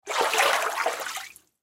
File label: Splash Bathtub, Water Splash Bathtub Small Hand Movement; Bathroom; Blastwave